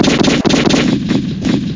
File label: guns; patrol boat